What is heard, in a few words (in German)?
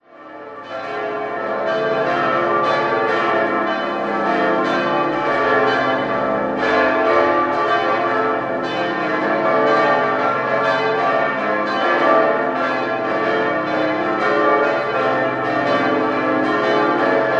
Muenchen, Glocken; Maximilian